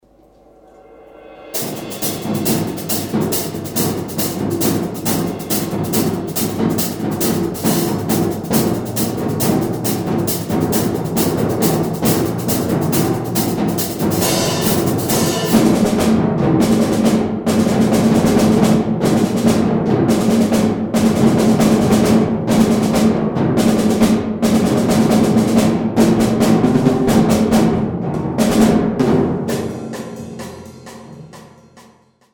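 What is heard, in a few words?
senior percussion ensemble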